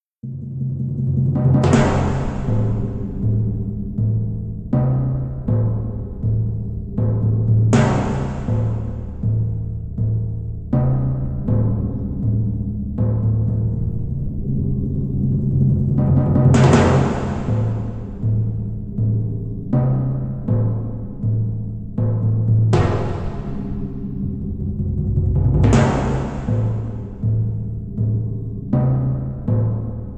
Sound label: Timpani